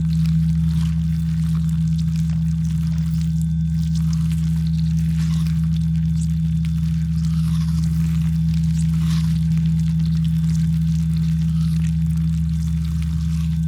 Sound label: ant ship science idle; biogenesis